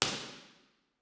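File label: Impulse Response Eigenmike; Fisica Tecnica Ambientale 2018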